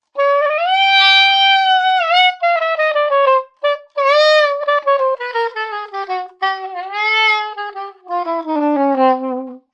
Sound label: A saxophone expressive.; Funny Musical